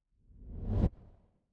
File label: transition riser